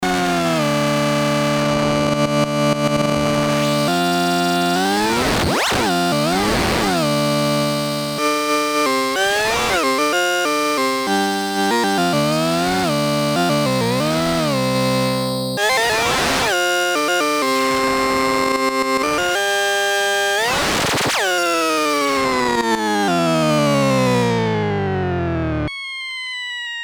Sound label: modular, a true modular; MFB nanozwerg